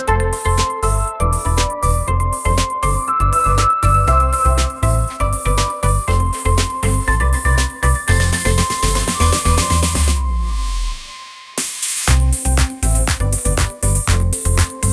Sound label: upbeat pop melody; ai